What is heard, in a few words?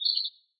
Bird Chirp; SoundsCrate